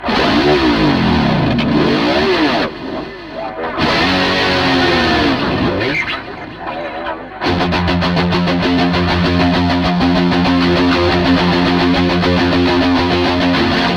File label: Guitar Riff; Rise of the Robots